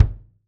Jazz Kick alt; Roland BK-5

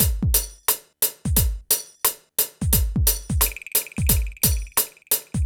PHATT BEA

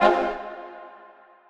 Orch Stab

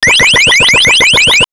Elec FX Computer Game; X-Static Goldmine

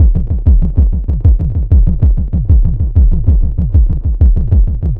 Synth Bass; Bass X

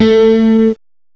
MANDOLIN; GUITARS; M8; CMI Factory

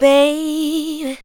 ADLIBS 90; Ueberschall; Jam Box

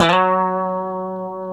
F#3, Guitars; Spectrasonics; Hans Zimmer Guitars VOL-1; 90's Sample CDs; COMP POP+VIB

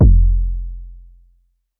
Dusty 808 F, hip-hop drum kit

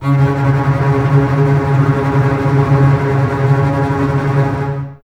C#3, Basses Tremolo, String Ensembles; Miroslav Vitous; 90 s Sample CDs

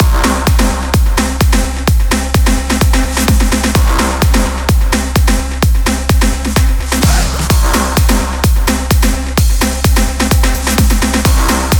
128 BPM Mix, Root Cmin; Vengeance; Essential Clubsounds Vol.5; Take me to LA Kit

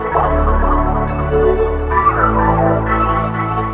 pad, Jungle; E-Lab; XTC Files of Jungle

